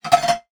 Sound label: pick up metal frying pan stovetop